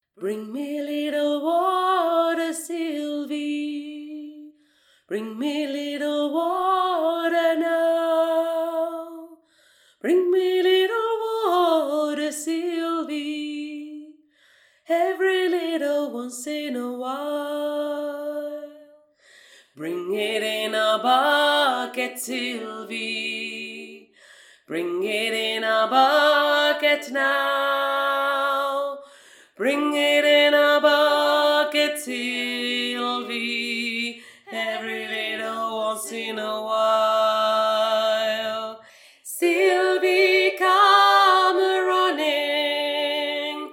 voix grave; BRING ME LITTLE WATER

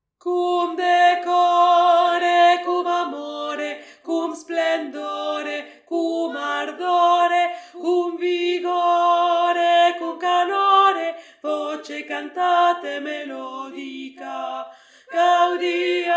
VOIX DU MILIEU; CUM DECORE

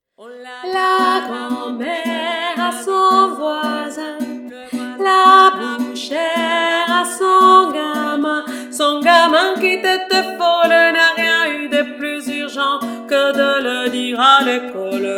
VOIX GRAVE

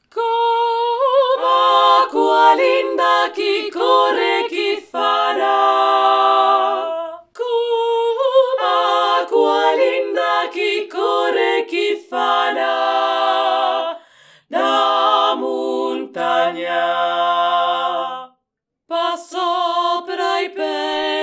3 VOIX; ACQUALINDA

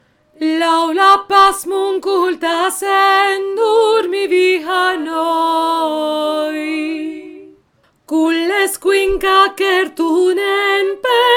voix milieu

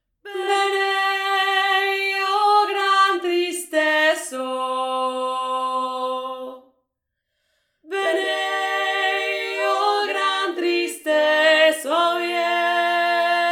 VOIX PRINCIPALE 2

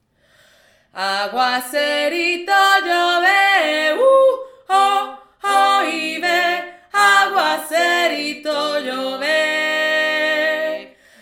VOIX 1

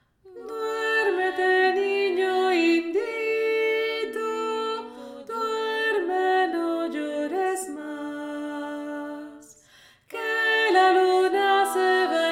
VOIX AIGUE; Duérmete niño indito – Trad. Guatemala; Une très belle berceuse guatemaltèque

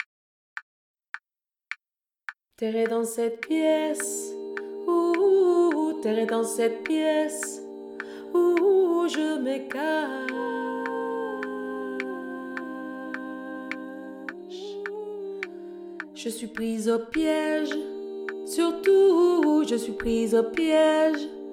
LE LOUP VOIX

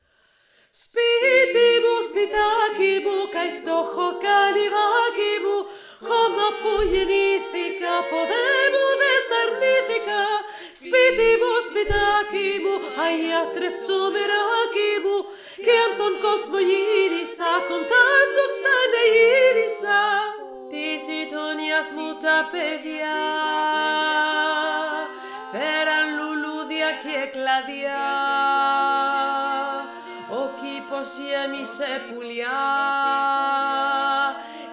voix 2 melodie principale

